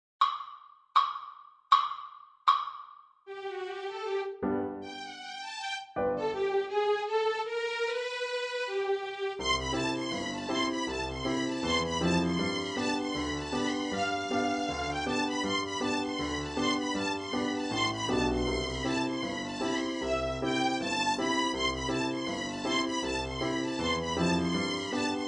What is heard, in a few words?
Low 80